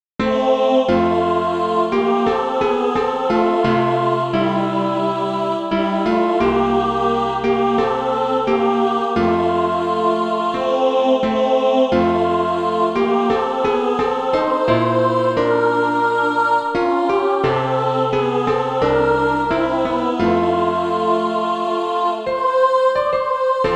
mixed; SATB

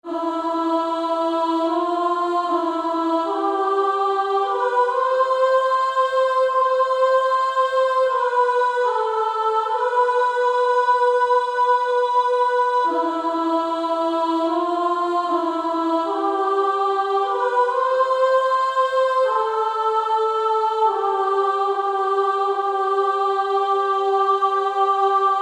Female Choir; More Love to Thee

